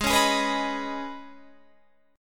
D sharp 7th Suspended 4th Sharp 5th G sharp, Guitar